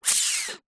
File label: human suck air through closed lips mouth